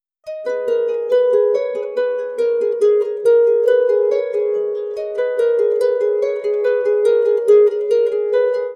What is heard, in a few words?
harp dna reduction